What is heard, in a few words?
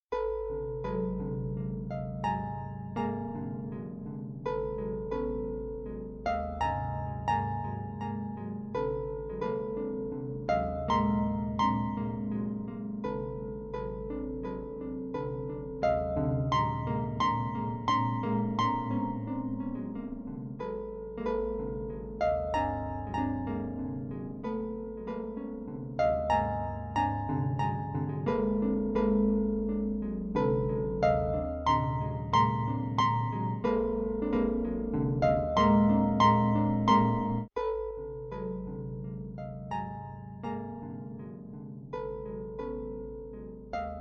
Arpa